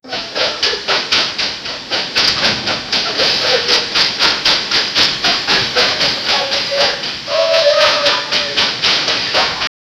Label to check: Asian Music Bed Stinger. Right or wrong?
Wrong